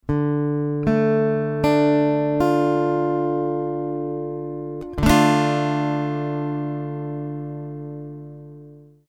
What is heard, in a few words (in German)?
d moll